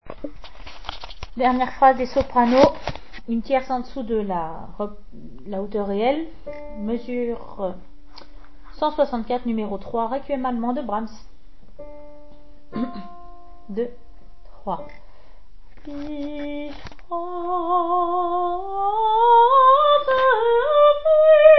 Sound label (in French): requiem allemand soprano; mesures 164 à 173 moins 1 tierce; Brahms